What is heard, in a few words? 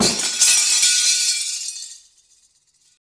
Broken Glass Stereo